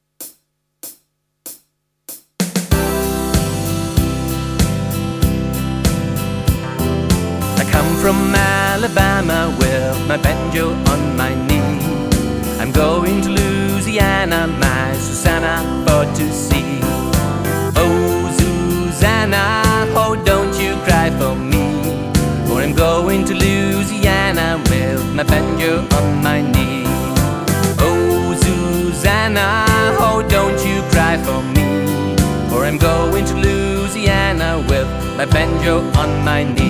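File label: Oh Susanna, ukulele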